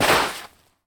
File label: Baseball Slide Dirt